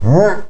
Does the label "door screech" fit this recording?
No